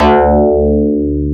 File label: XFM BS, FM Syn Bass, Synth Bass